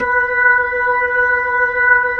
B-3, Jazz

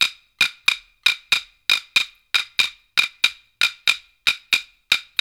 World Dance; OZ!; Abor

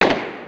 DR-660 FX; Rubber Chicken Software Sample Bank

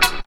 combi drums

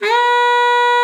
SLID B4, ALTOSAX; Xsample VOL-2

